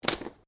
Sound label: Branches Dead Snap